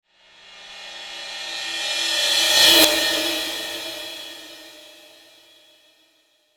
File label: reverse